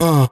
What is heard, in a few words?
uh